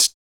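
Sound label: vox percussion, Vocal Planet Jazz FX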